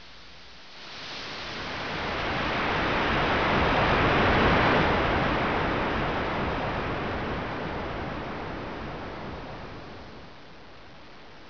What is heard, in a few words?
ocean